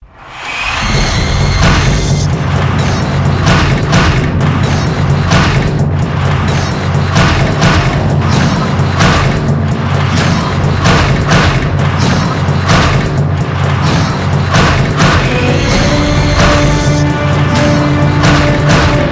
Theme; Vox Machina

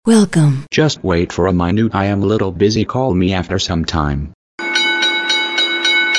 Caller Tune

